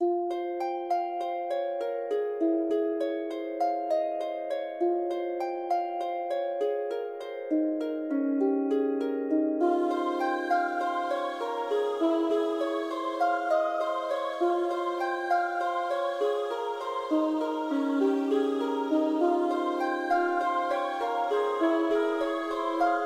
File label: harp